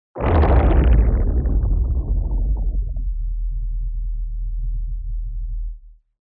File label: Under water